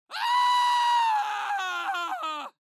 Scream

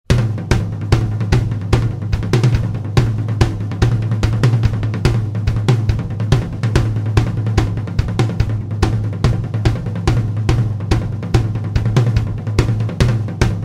Drums Only; Soundzabound Volume 5 Disc 3

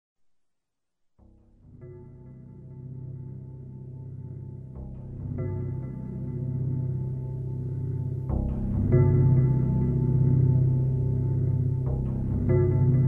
Ambient

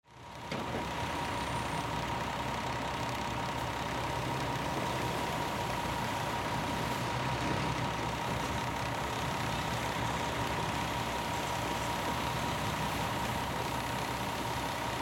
construction

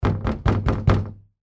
door knock hard

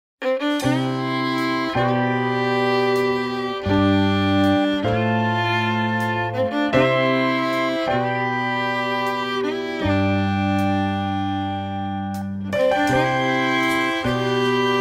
Modern Country; Soundzabound

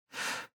paniced breathing

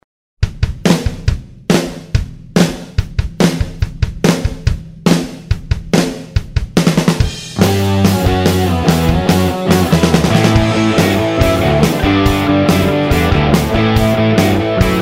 Party Rock; Soundzabound Volume 5 Disc 3

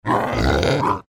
pig oink

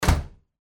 door slam

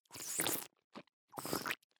Slurp

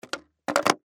skateboard ollie land

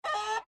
rooster bauk

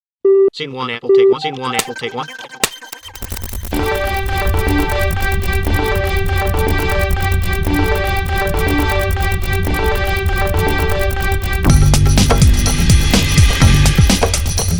Cool Newz TV; Soundzabound

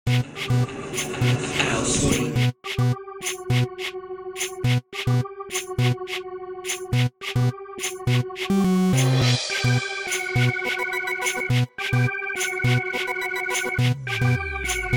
Reggae

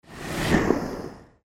skateboard

